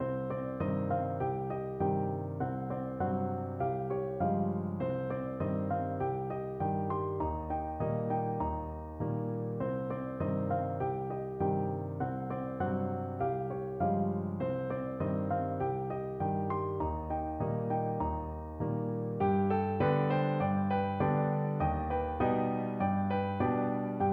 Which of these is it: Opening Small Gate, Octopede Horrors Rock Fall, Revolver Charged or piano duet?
piano duet